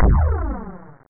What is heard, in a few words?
HORNET